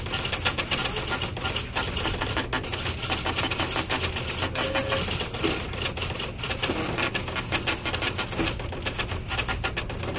printers running

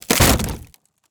wood crack impact

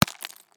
stone hits ice

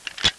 door unlock

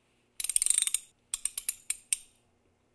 Socket wrench

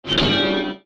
metal hit reverb

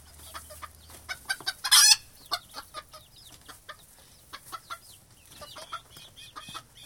chicken farm

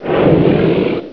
LION

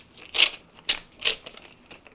ripping paper

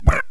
bouncy toy

